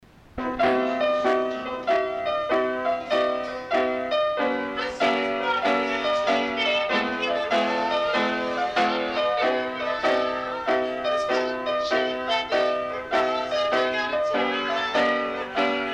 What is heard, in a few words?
Piano